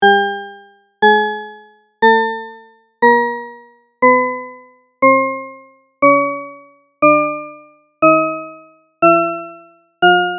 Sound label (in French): Gamme infinie